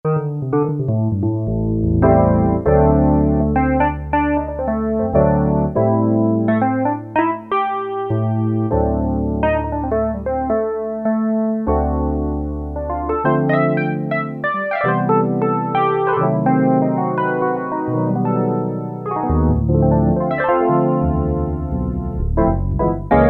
kbd, E-mu Proteus FX; Roadz 1

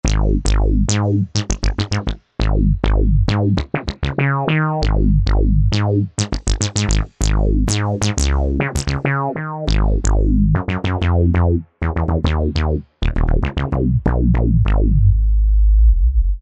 Bass Res Bass Comp, synth

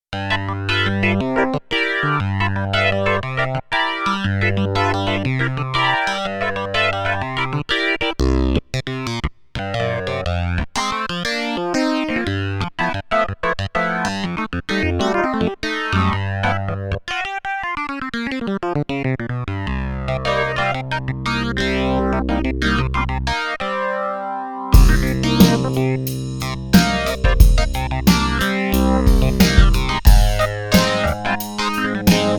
Clav, Korg Radias-R; Factory Presets; ProfeticClav

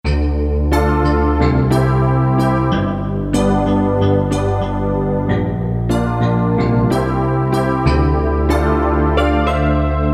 D50&PIZZ; Korg DSS-1; ''D''-Synth Sounds

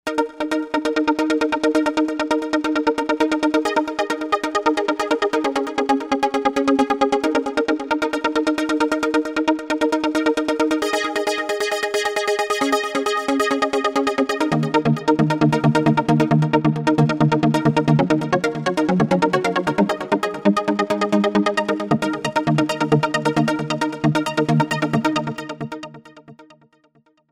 Simple Env Velo Arp, Novation UltraNova; SuperNova Soundpack Volume 2

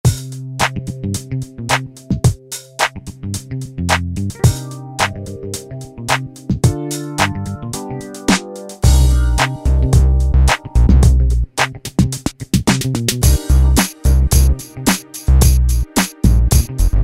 Steppa, Yamaha DJX Styles

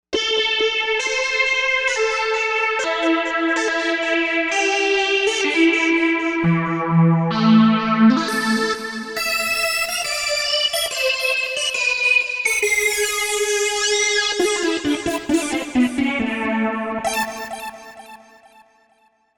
Hyper Breath, Novation UltraNova; Factory Patches

